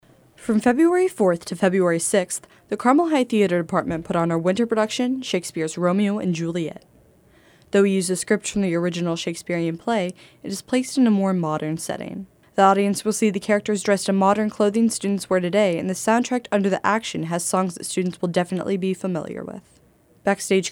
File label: BEAT